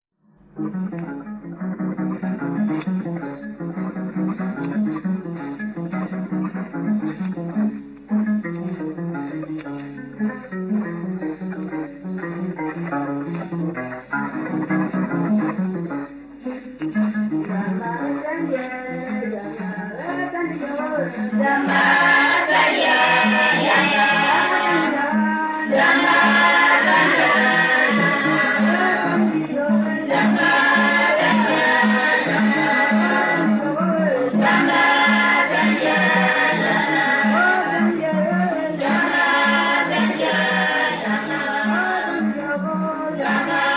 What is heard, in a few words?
njembe